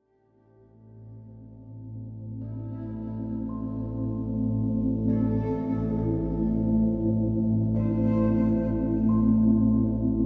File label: handbeat orbit